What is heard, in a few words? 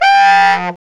SAX Baritone SAX, FX